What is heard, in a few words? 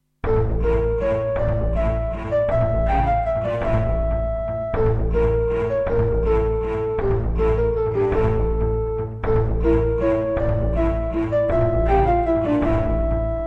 EMU SoundEngine